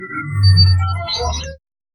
the sound of creation an, ethereal

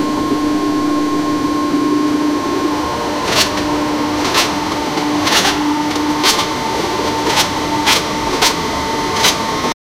a steam engine starting, train

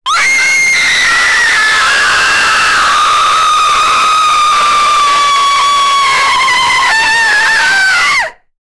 woman 40 age screaming; jumpscare

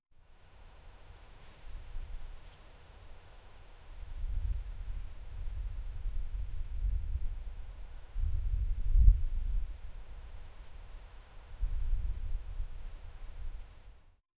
the sound of the wind, field

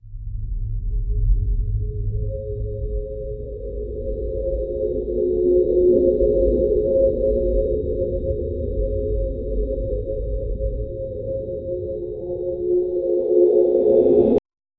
in out sound cosmos vibe

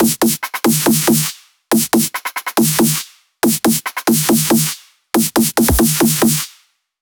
fast paced dubstep snare

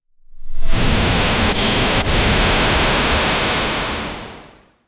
generate a high impact vfx

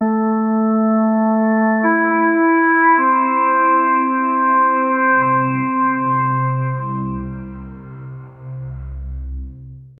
melody

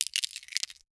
equip chainmail; sfx arpg ui

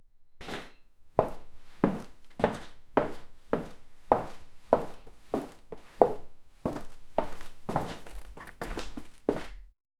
footsteps on solid glass

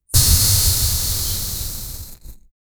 bullet whizzing

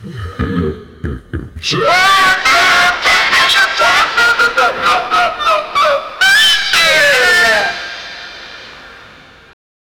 big satanic party in hell

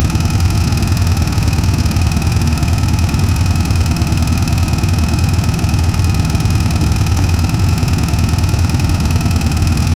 two stroke karting engine; racing

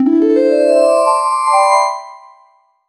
retro arcade coin sound, major chord

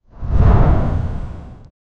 fantasy dark fantasy fire

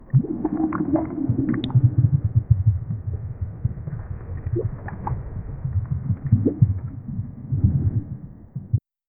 underwater perspective, pool

